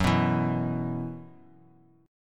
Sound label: F sharp 7th Suspended 4th, down; strummed